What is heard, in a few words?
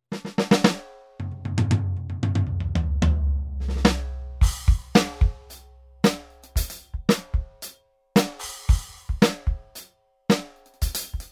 ATV a5D Kit Lo Fi; Steven Wilson Kit